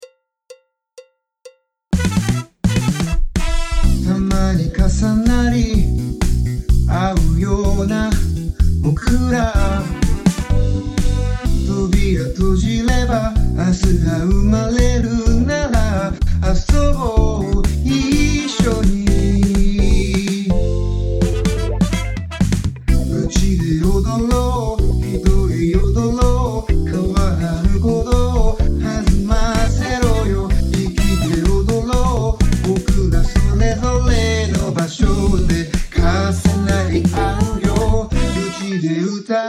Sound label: Disco